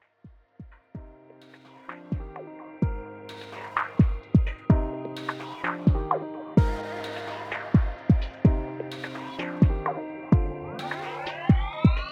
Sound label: commercial score